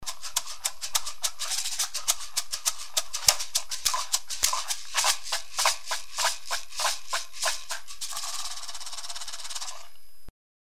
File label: Shakers